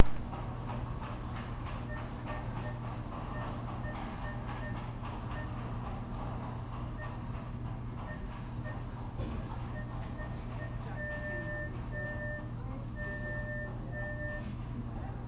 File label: Doctor office